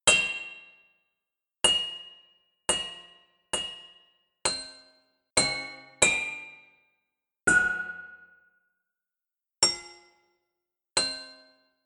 fire extinguisher

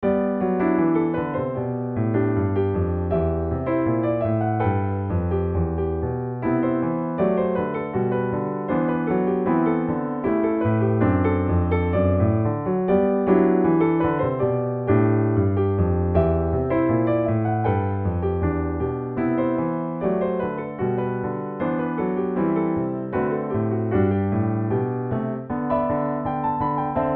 Short fast Jazzy G March

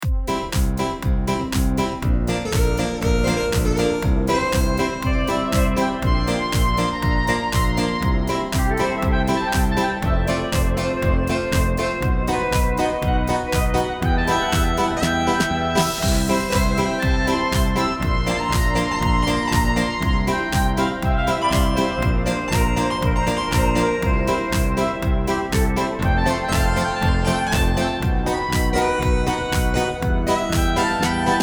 Harpsichord as a Guitar

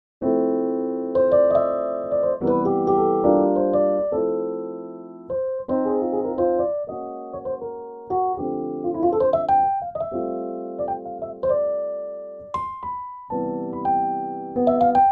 soft Rhodes

